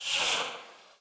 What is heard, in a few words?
grabbity beam spawns; player